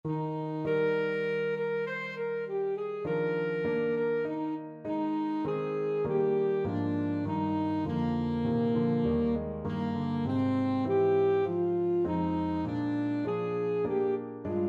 repton, saxophone

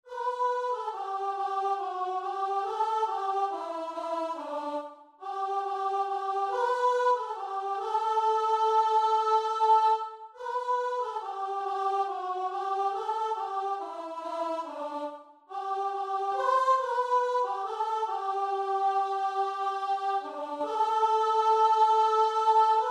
guitar and vocal

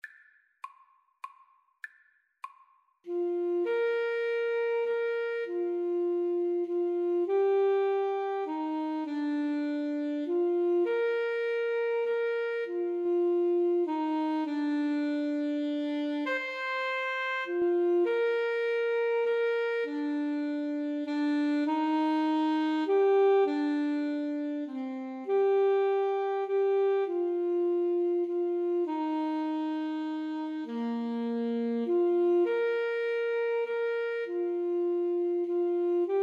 amazing grace, Clarinet-Saxophone Duet

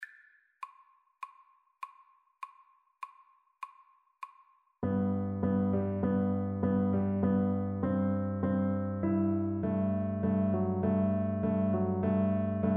DB, Double Bass

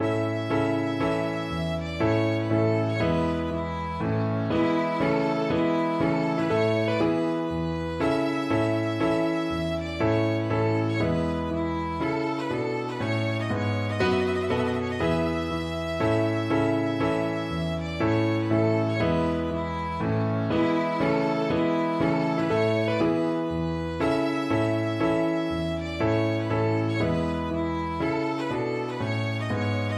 tpt tune violin; clarke

